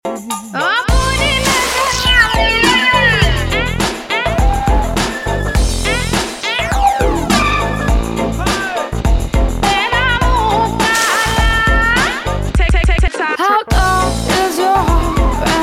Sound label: Remix